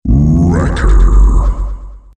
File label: wrecker deep; csgo